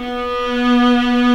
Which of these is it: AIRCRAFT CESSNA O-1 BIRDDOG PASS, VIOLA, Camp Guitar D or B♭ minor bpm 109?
VIOLA